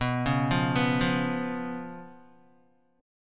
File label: B Suspended 2nd Flat 5th, Guitar; Bsus2b5 arpeggiated; 6,4,5,4,5,x; 4F,4A,5C,5G,5C,6E; JGuitar